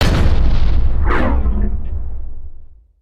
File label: rocket build slot hit